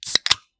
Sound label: Open The Can